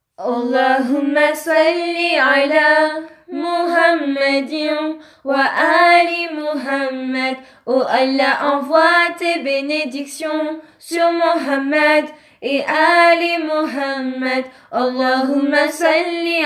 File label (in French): Comptine